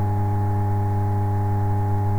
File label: Sr1 idle; finnish